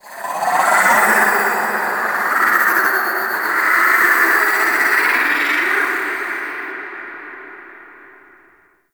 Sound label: Golden Maw Ambient Whispers; Warframe; The War Within